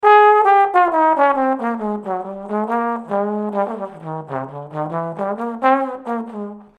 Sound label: Trombone